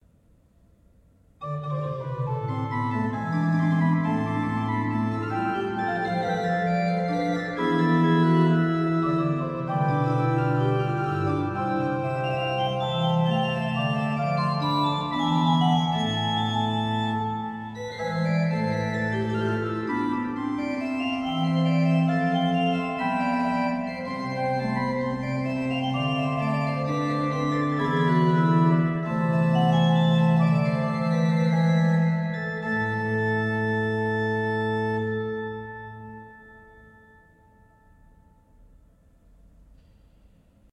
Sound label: Nagels, pipe organ; Nagels 5 I